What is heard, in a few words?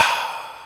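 snare Breath, EMU Orbit-3 drum machine; 300 Drum Machines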